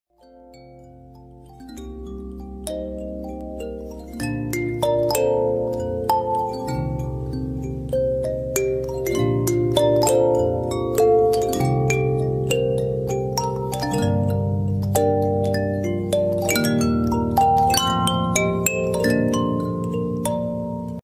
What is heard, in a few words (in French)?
musique, instruments insolites